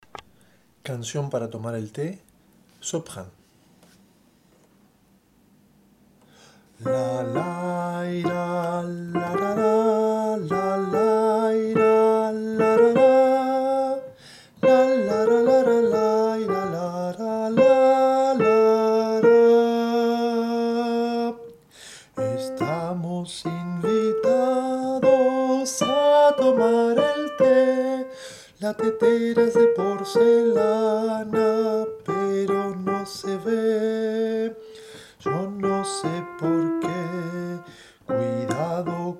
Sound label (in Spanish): Cancion para tomar el te soprano